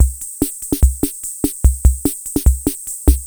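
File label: ROCK, drum machine; Crypton - DrumBase5000; TR-66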